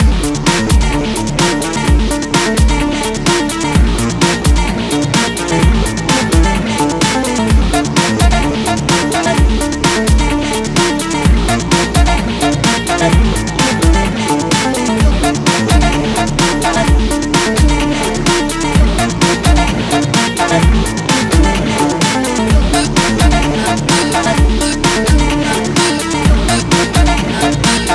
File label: house loops